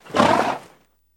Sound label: Horse Blow Generic